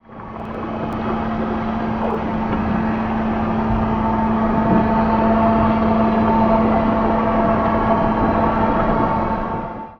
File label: pump